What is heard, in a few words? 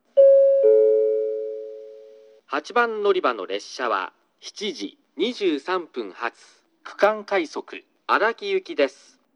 kokura regional rapid araki, portable recording